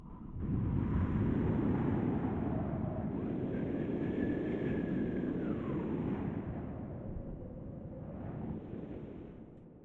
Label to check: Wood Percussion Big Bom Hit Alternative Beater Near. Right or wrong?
Wrong